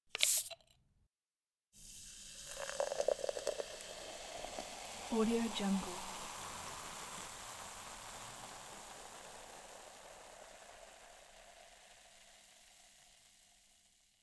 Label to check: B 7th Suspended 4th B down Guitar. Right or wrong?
Wrong